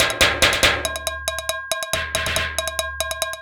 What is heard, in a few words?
Tins Topline (140BPM), household rhythm samples Loops